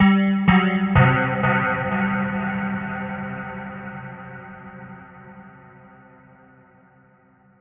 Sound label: bell echoes, synth and electronic loops